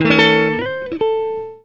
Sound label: EGUIT CHORDS; Best Service; World Colours